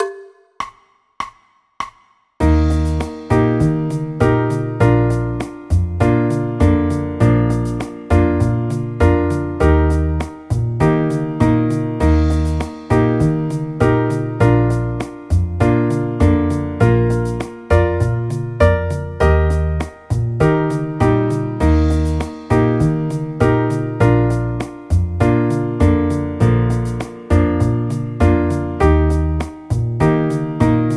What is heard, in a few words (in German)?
eb dur akkordverbindungen